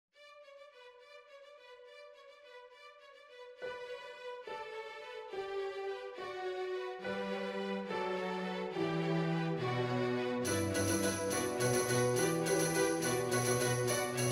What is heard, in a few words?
Carol of the Bells - Junior Strings; Junior Strings and Piano